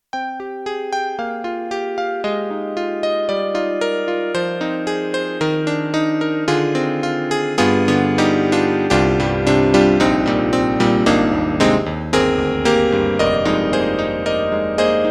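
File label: YAMAHA MU1000EX, Electric Grand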